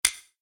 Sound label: SpinStop; slots; Sugar Town